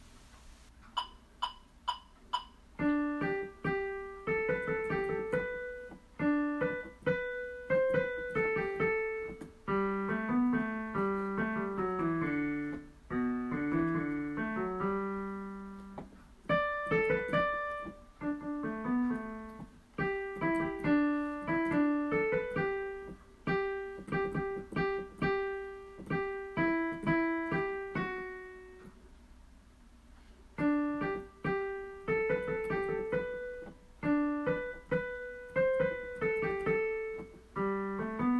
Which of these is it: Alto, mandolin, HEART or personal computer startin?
Alto